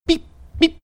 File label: beep beep